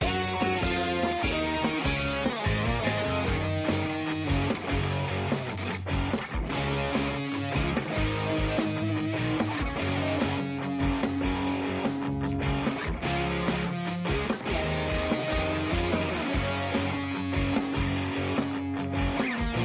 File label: music cars pre race setup; lightning speed